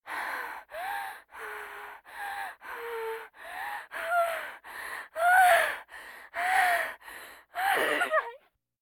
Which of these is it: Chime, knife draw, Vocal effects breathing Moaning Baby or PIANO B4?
Vocal effects breathing Moaning Baby